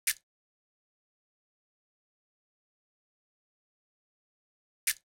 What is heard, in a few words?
Drum Loop 100 BPM Percussion, Hip Hop; Drum Loops; Loop Stems; Cymatics; No Se Nada